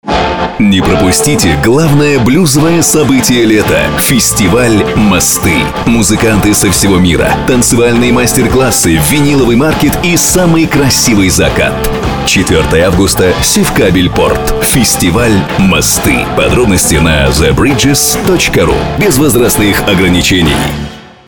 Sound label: jingle